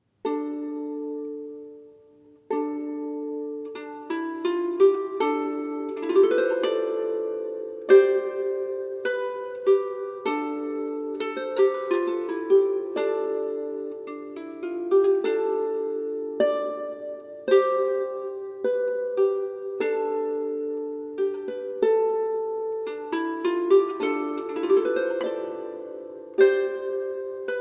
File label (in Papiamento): Harpa